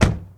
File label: Truck Ford250 Door Close; Trucks